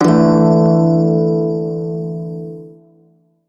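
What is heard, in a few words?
pentatonic major chord, shawzin; Warframe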